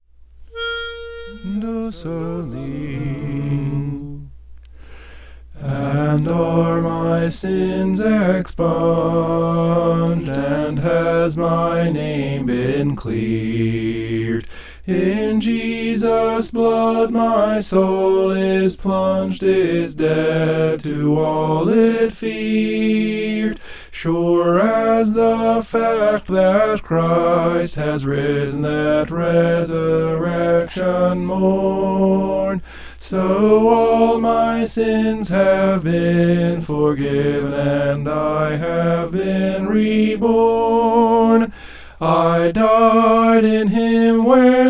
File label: and are my sins soprano